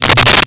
softswitch

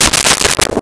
Turn Page of Hard Cover Book; Office Supplies

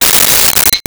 Metal Clang

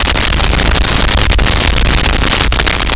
Sci Fi Drone Loop

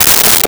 Box of Nails; Tools

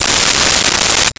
Rachet; 1001 Sound Effects

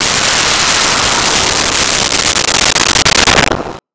Missile Fly By, Weapons; 1001 Sound Effects

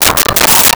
Chicken Crow; Animals

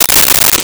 Tape Measure Closed; Tools

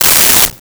Hydraulics, Mechanical

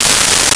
Aerosol Can Long Spray, Bathroom; 1001 Sound Effects

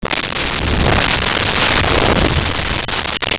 Shuttle Fly By, Science Fiction

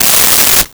Hydraulics; Motors & Switches; 1001 Sound Effects

